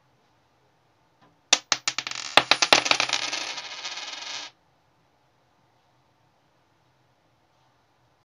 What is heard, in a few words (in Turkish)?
bozuk para sesi